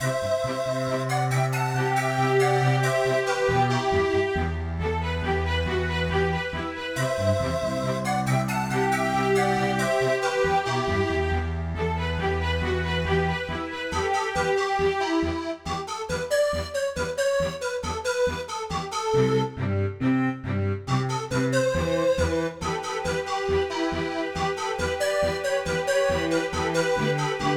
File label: midi